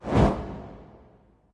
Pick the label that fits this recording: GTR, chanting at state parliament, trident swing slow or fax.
trident swing slow